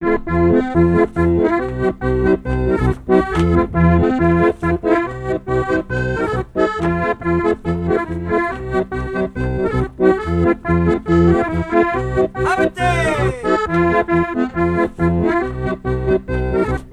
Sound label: alto